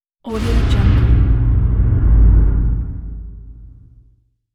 deep thunder whoosh; hollywoodedge

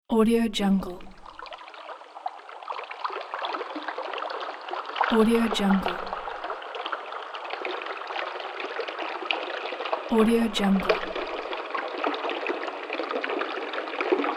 underground water source

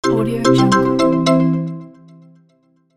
new message

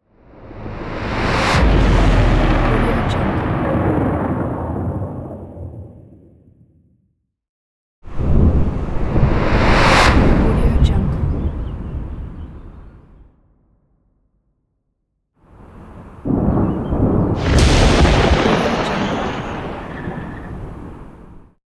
thunder transition

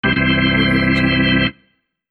tada organ

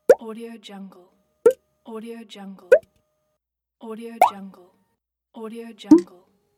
pop sounds

